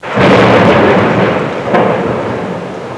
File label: THUNDER, NATUR